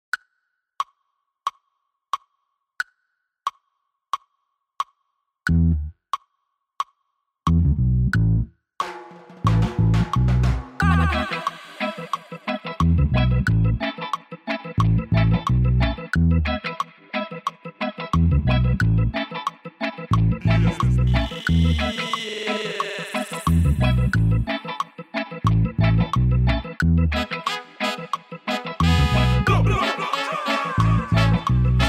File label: with click; Drum Kit exam